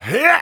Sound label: Taunt exert